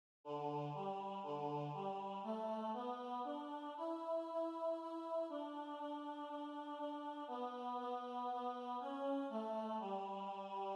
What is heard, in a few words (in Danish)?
Den blaa anemone arr. KBH Tenor